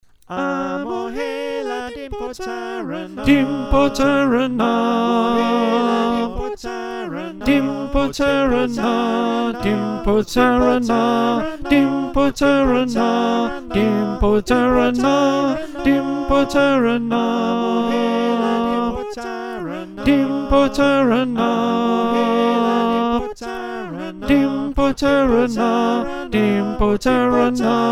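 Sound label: Alto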